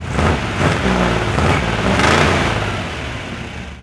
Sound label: Car Rev; Grand Theft Auto Vice City; XBOX